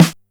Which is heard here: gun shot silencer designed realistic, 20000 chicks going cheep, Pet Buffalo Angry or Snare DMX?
Snare DMX